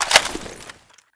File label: xm8 mag out; czero opforce